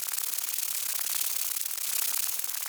fs gobb fly; netent

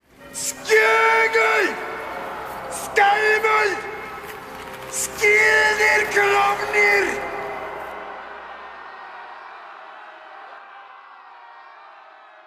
free Spin SHOUT; Floki

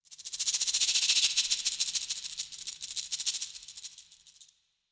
ambience rattle; netent

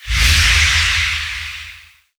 swipe Bonus Game; netent

